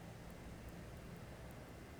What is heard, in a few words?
pulses, BALAENOPTERA; MONACO 2022-07